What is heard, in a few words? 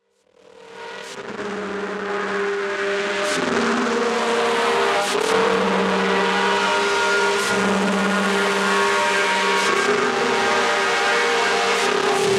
Interference Field Transmission